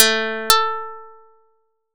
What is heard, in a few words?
str synth test